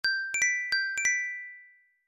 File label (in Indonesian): Nada Dering iPhone